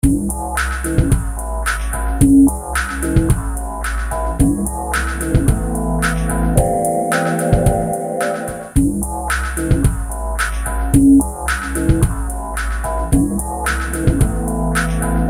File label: electronic imprints